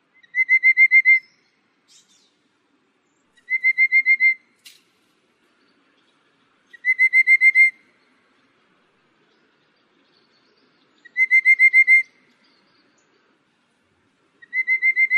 山紅頭; 紅頭穗眉; 畫眉科/留鳥

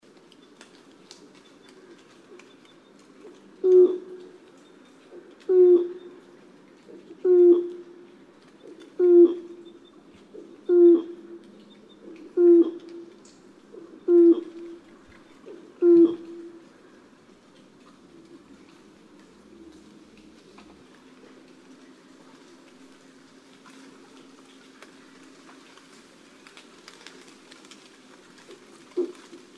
黑冠麻鷺; 黑冠鳽; 鷺科/留鳥

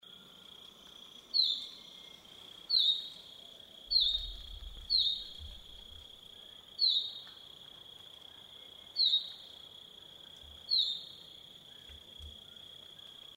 野鴝; 紅喉歌鴝; 鶲科/冬候鳥

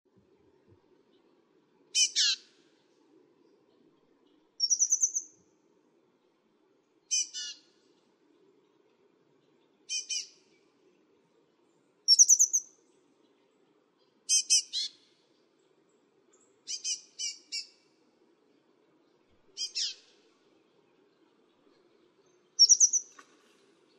綠畫眉; 綠鳳眉、白腹鳳眉; 畫眉科/留鳥

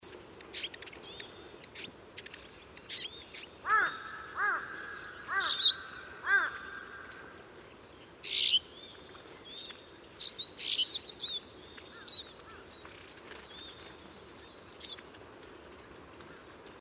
黃雀; 雀科/冬候鳥

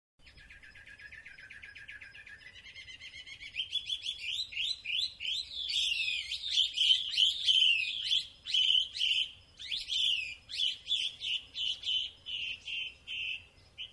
台灣畫眉; 噪眉科/留鳥

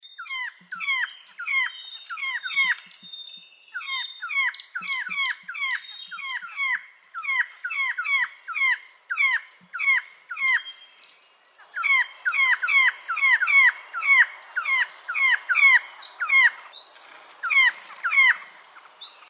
星鴉; 鴉科/留鳥

